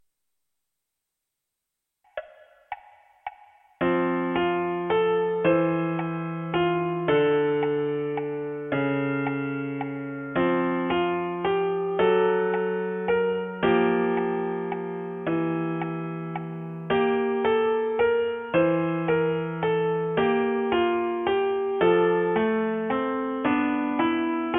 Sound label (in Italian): piano unite